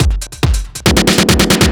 Overdrive Fill, Drums Beats

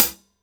HH CL, Drum 1 Dry; East Collexion

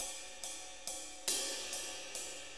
6-8 Ride, HiHat & Ride Loops; Sonic Foundry (Sony Creative Software) - Loops & Samples Drum Tools

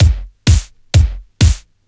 Straight Loop 128 BPM, House

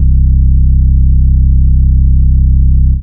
Bass C1, club techno; Zero-G

